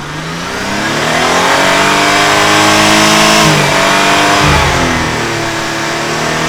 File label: rev loop, Engines; E-MU Producer Series Vol. 3 – Hollywood Sound Effects; Ambient Sounds